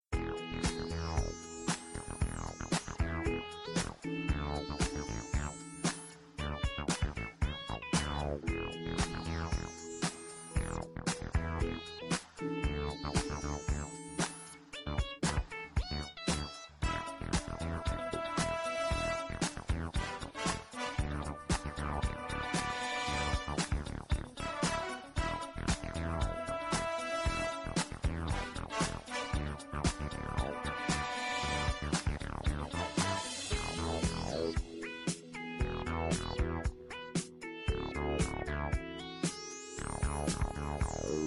music; geometry tower

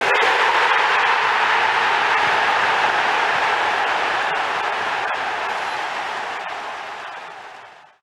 crowd; quarterback

